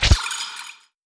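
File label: light sword, attack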